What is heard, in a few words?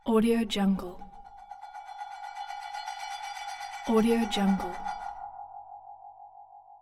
sinister intro